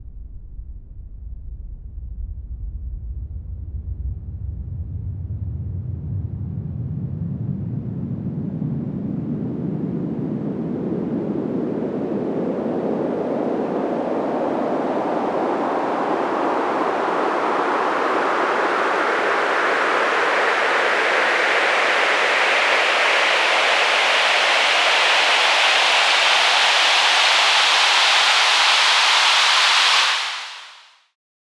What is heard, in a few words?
Long Sweep, White Noise